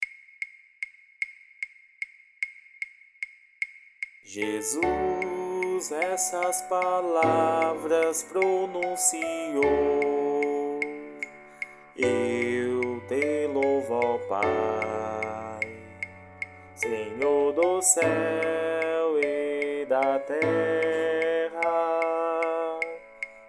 VOZ AUDIO DE ESTUDO Jesus essas palavras pronunciou

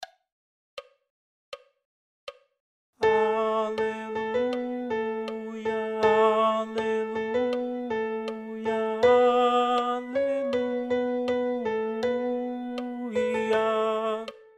Aclamacao coro

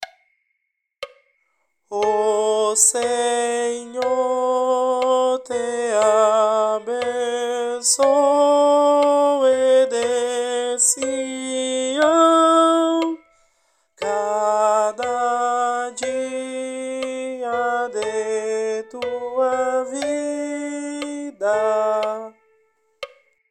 Salmo coro; Salmo 127

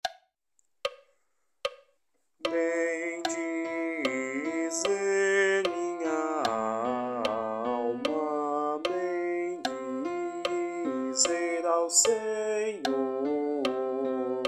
Salmo coro

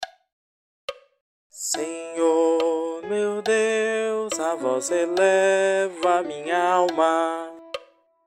Salmo Advento coro

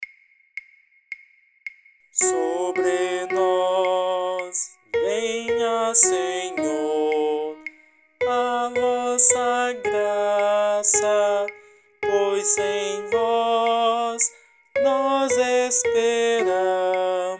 Salmo coro; Sl 32; salmo responsorial 32 33

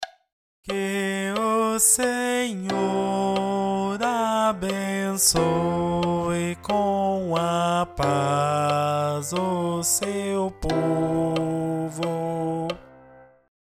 Batismo coro

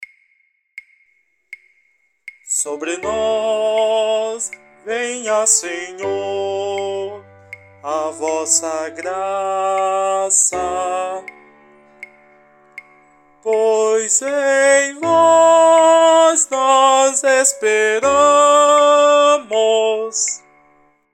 Salmo coro; Sl 32; salmo responsorial 32 33

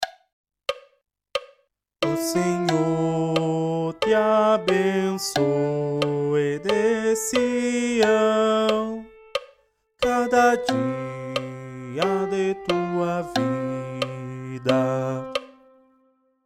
Coro

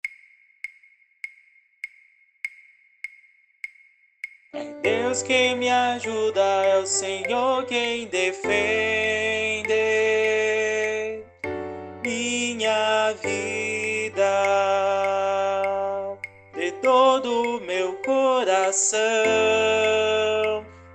Voz estudo Cantico de Abertura Mostrai-me Teus Caminhos

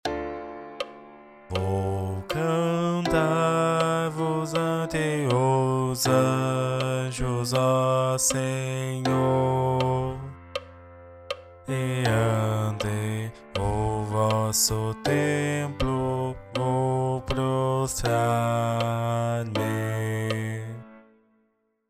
coro

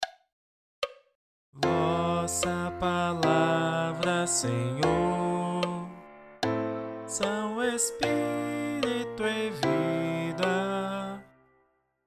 coro

